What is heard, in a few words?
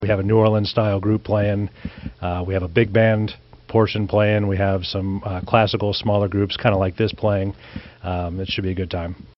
Brass; 1st infantry division band; holiday concerts